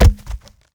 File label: cask bounce; witch doctor